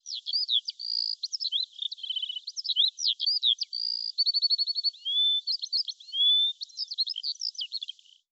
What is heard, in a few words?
little birds singing in the trees; mixkit